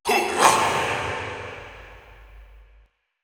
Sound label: Processed Battle Shouts, Viking Vocal